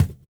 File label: EQ Perc, Percussion Mix